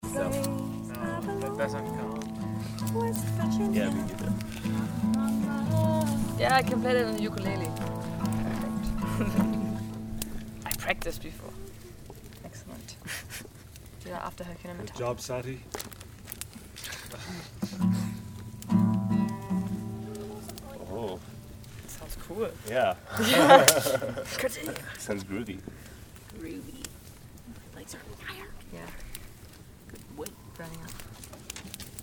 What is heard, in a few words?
guitar; Bomfire Sesh 2; Saty